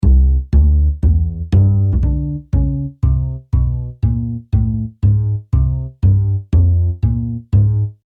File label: bass